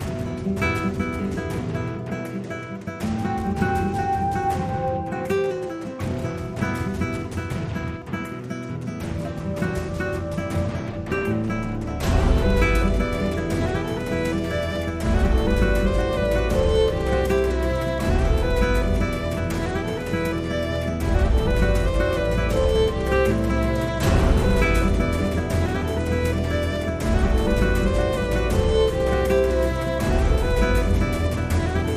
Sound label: Alt Percussion